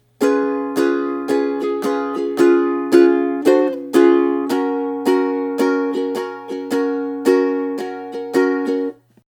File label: Chorus, ukulele